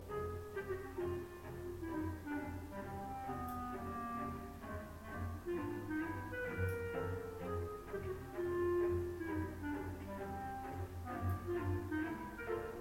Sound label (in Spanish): marcha